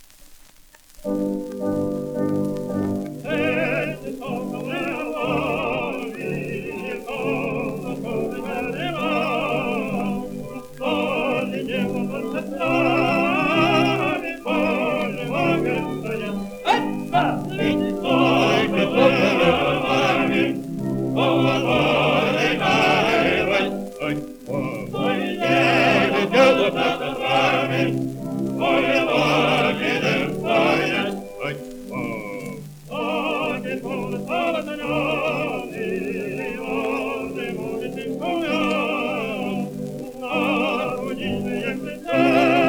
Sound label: cossack marching song; other cossack choruses